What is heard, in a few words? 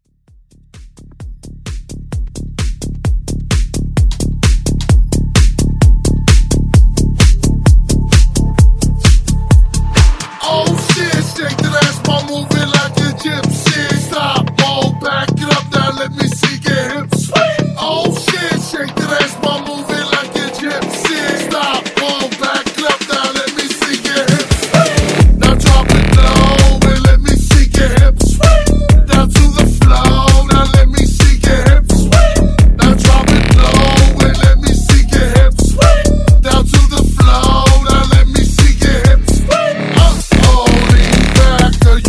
Swing House